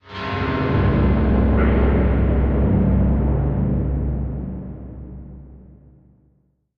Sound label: UI Prop Encrypted Stinger